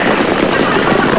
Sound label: Fanfare; SNES; Thoroughbred Breeder 3